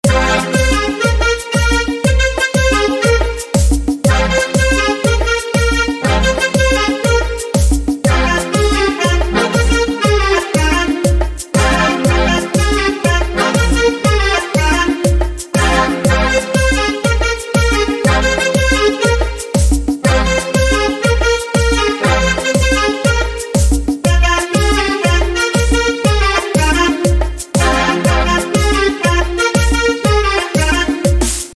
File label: Instrumental Ringtone; hindi instrumental ringtone